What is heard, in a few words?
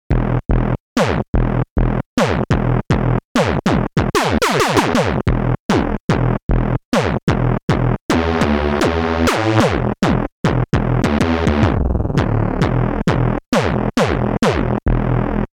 hohner hs-2 e sounds, pitch envelope on 4x ring 8op